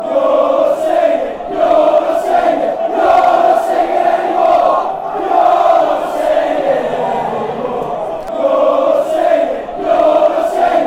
youre not singing anymore, fanchants; torquay

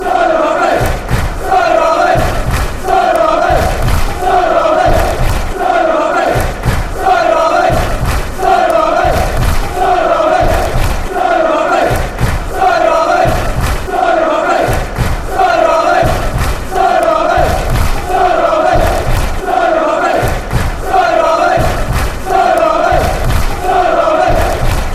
cider army, fan chants

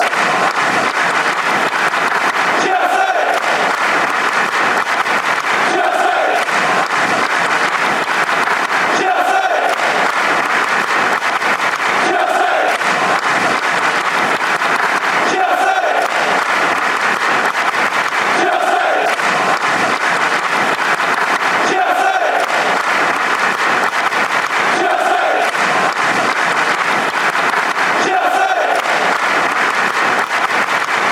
chelsea clapping

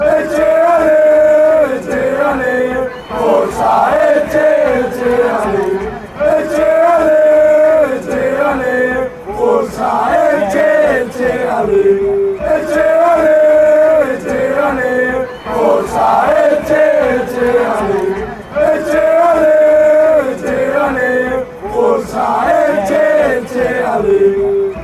elche ale, fan chants

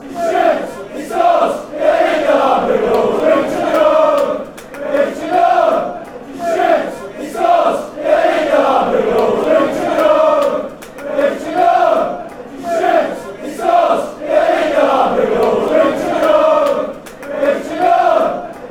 bolton chant

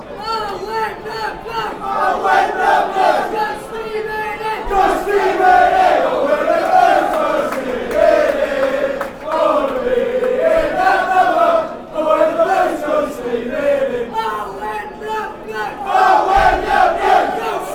when blues go steaming reading, fan chants

